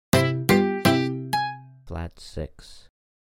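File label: Flat6 Acoustic 12 String Steel Muted Guitar Major Degree Name; ear training instrumental color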